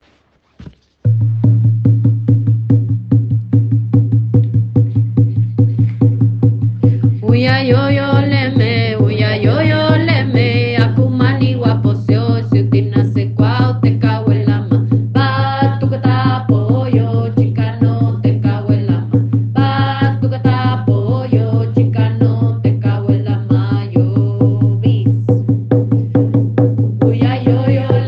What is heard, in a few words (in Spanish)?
canciones tambor danza de los espiritus